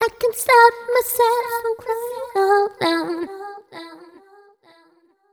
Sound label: f 128 mel e, fem Vocals